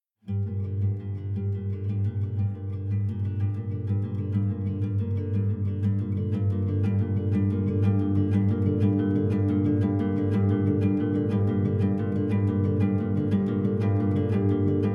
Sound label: Savannah Music